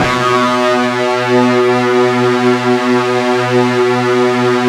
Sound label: FUZZ B 1, Guitar; ULT FZZ LOOP; East West; Steve Stevens Guitar Samples Collection